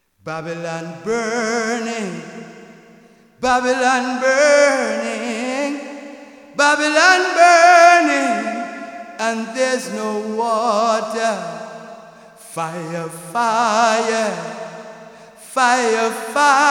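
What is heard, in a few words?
Jingle, reggae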